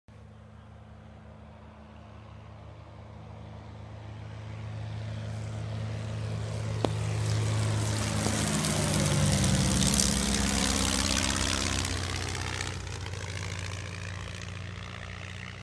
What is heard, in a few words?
Cessna plane; هواپیما سسنا